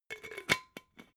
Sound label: glass bottle massive close cap